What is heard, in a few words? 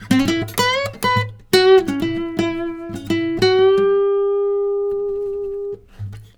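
baritone lick 120 E7, Blues Guitar Samples; ILIO; Fretworks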